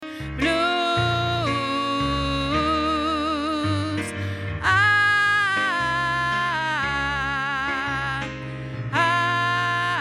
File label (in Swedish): alt, stämmor